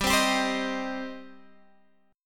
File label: G Suspended 4th Sharp 5th, Guitar, Half Step Down